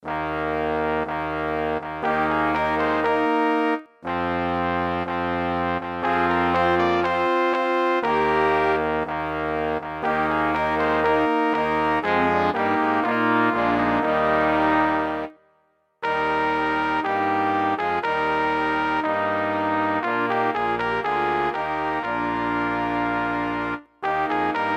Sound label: Fanfare